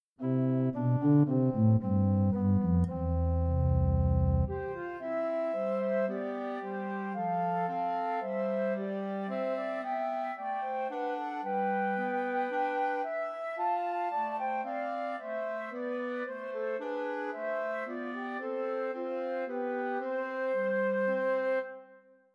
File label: Christmas Trios for Two Flutes and Clarinet; While Shepherds Watched